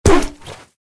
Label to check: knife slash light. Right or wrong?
Wrong